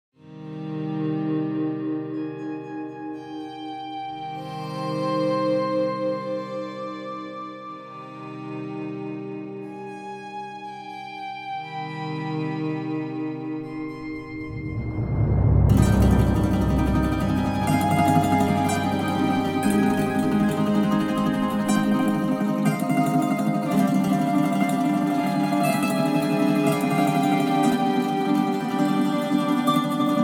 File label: guitar; Western